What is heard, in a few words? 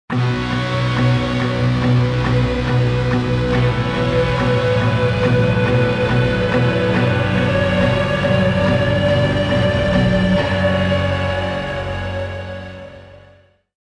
Modern Agitated; Civilization Revolution